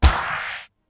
gold sword buff

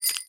p atk loxmetal

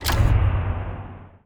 failed

